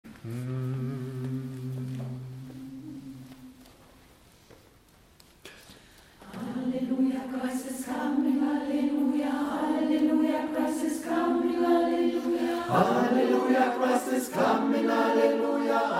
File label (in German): alle; Alleluja Christ